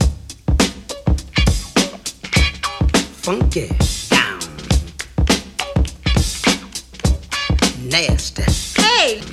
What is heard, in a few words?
103 bpm modern drum groove c sharp key